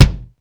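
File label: 2 HEAD KICK; Hollywood Edge; The Big Bad Beatmachine; HR-16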